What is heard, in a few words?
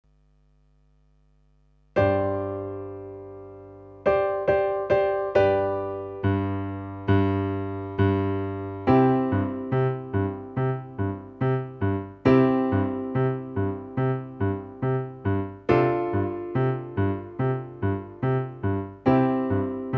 easy piano part